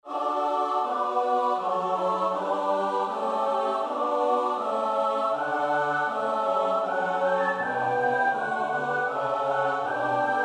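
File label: soprano alto tenor bass; Bach (Johann Sebastiaan); BWV 184; Herr, ich hoff je, du werdest die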